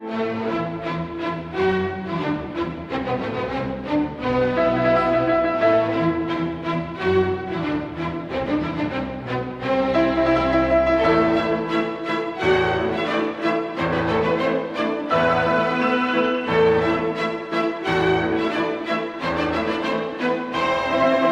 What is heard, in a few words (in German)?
Marsch des Loewen